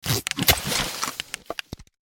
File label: zippers and clicks